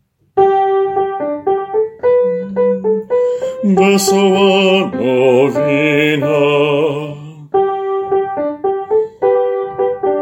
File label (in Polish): BAS; Dzisiaj w Betlejem